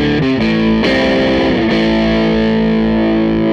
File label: Les Guitar Loop